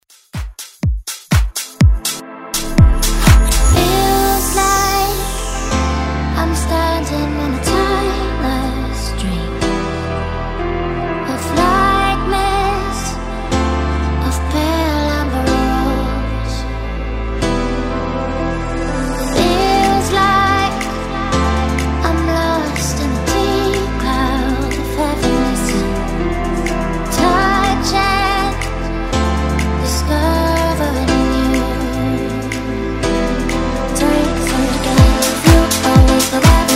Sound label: House Redrum Intro